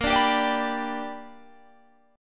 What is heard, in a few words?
B Minor Major 7th Sharp 5th